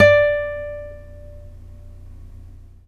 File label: NYLON P D4, GUITARS; Synclavier Sampler Library